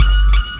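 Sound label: WACK TPT, trumpet; E-MU Formula 4000 Series Vol. 1; Hip Hop Nation; Raw Phatt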